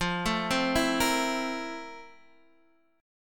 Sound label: F Major 11th Guitar Half Step Down